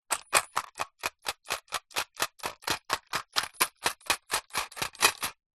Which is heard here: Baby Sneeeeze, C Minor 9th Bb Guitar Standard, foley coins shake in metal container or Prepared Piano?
foley coins shake in metal container